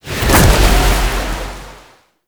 Void Wind Whoosh A; Ultimate Abilities; Vazarin; Warframe; Focus

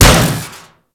Impact Metal Heavy; Furax; Impacts; Weapons

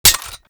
Revolver Rifle Mech; Warframe; Weapons; Tenno

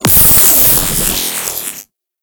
Corruption Pickup Hostile Large; Warframe; Zariman; Missions

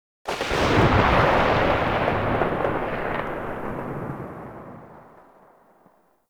Exalted Sniper Tail; Warframe; Frumentarius

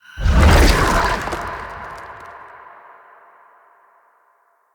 Spawn Burst; Enemy; Characters; Abilities; Mendicant; Mnd King

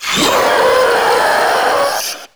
Proto Infested Ancient Heavy Bark, Enemies Vocals; Warframe

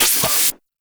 Codex Activate; Hub; Liset Machines; Tenno

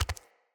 Walk Default, Footsteps; Characters; Enemy; Mockeries; Mockery

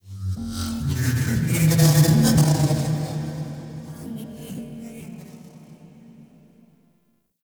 Tech Texture Med; Warframe; Ambience; Cephalon Weave

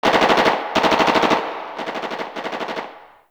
Distant Gunfire; Warframe; Ambience; NineteenNinetyNine

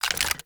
Clip Chamber; Warframe; Kraken

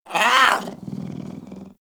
Kitten Speak Playful; Enemies; Warframe; Catbrow

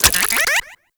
Lure Hold Position; Warframe; Teralyst; Vomvalyst Lure

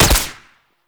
Pistol Shot; Warframe; Weapons; Tenno; Lasrian

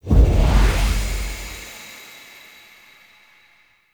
Transition Stinger Out; Warframe; Tau

